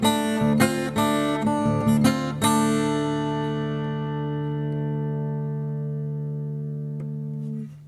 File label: Dadgad Rhythm